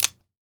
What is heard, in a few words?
Weapon Gun Pistol 38 357 Revolver Dry Fire; AdobeCC